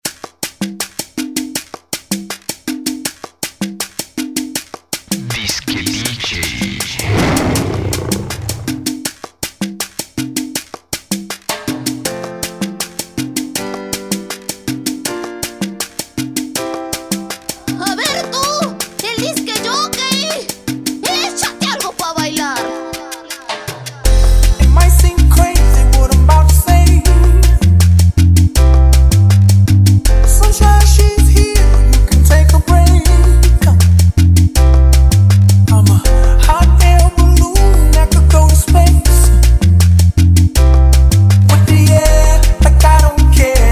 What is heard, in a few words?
Salsa